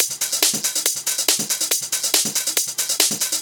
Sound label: Loop 140 BPM; vengeance essential club sounds-3